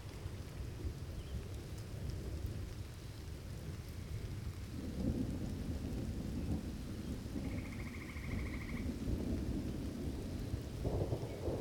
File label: Thunderstorm; Nature Sounds; The Natural Sounds of the Wilderness; Echoes of Nature